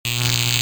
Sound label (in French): Electricité